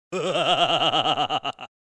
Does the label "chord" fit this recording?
No